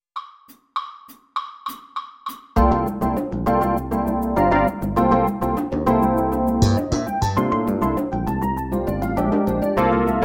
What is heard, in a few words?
Drum Set PlayAlong FUNK; Drum Set Etude A-2 Funk Track; 2025 Nebraska All-State Jazz Band